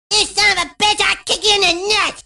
kick; Hacked Hot Wired and Channeling Cartman

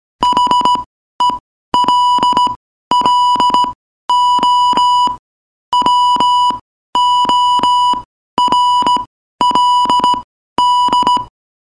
morse code; Arduino Music

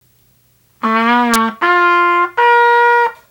homemade trumpet; Bugle

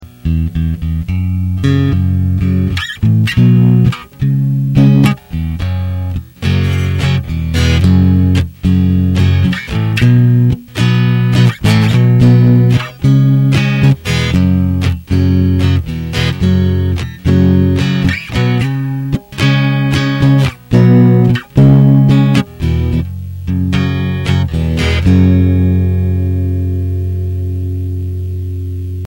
volume low eq all dwn; private amp from a old personal cassette player

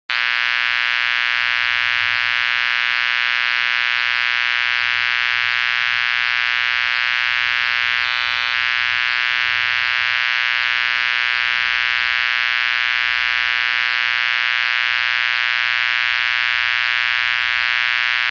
laptop adapter; Listen to Your Electronics

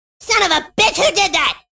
sob; Furby; Hacked Hot Wired and Channeling Cartman

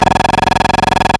Infrared Noise

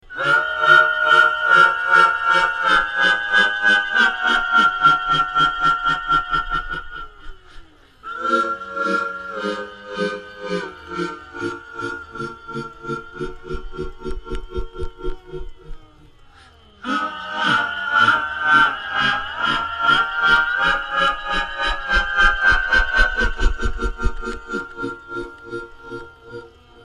tremelo sample; Anachronistic Audio Effect Unit Tremolo

